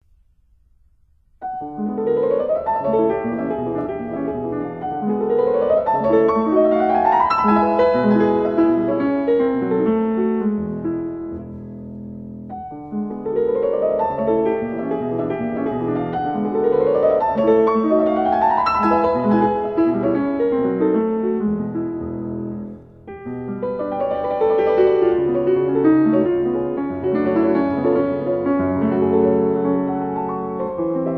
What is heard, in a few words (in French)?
Pieces lyriques pour piano; Butterfly; Edouard GRIEG